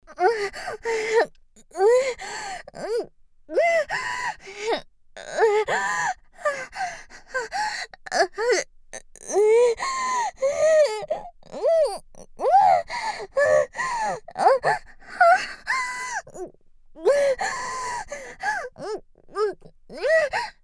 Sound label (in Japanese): 狐喘ぎ